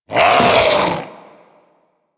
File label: Vocal Attack Short, Hunting Critters; Warframe; Vulpaphyla